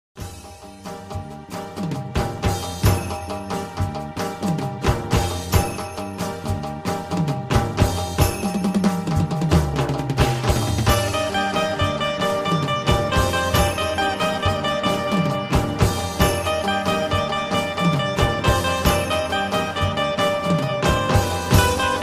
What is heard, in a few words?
dandiya instrumental ringtone